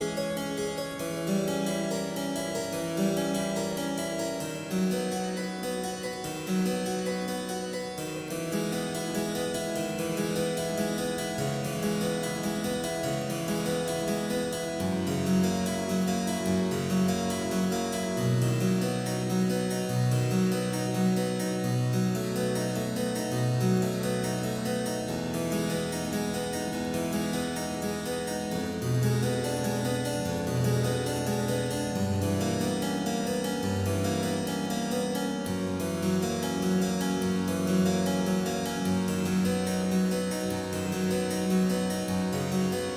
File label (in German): Praeludium C-Dur; bwv 846; clavier buechlein fuer anna magdalena bach 1725